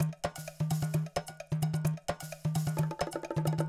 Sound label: Loop Percussion 130